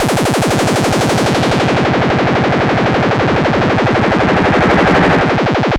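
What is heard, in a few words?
monodelay Fm 128, Recorded with monotron delay; instruments; loops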